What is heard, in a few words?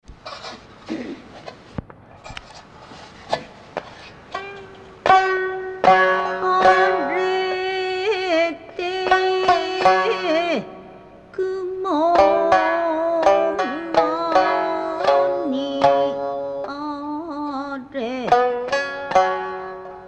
Shamisen